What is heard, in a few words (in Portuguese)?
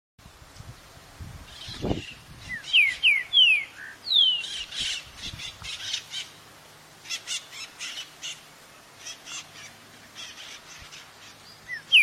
canto do trinca ferro verdadeiro